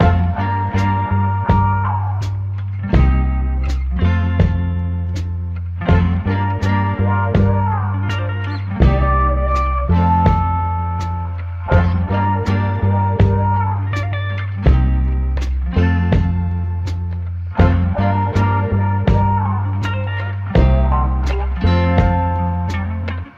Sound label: guitar, Loops